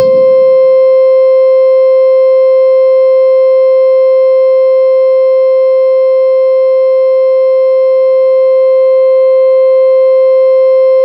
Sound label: C5, SynthLine